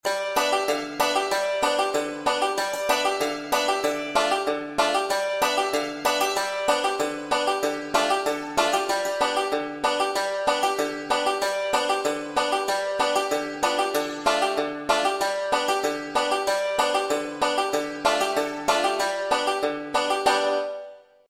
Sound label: g dm chord acc, banjo; 5 string; old joe clark